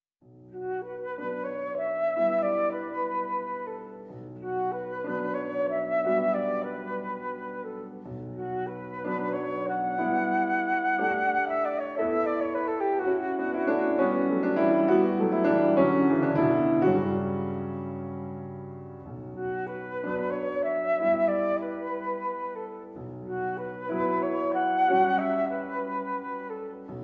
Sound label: Flute Sonatina